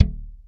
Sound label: BS CHPSTK, ChapmanStick; Roland LCDP02 Guitar and Bass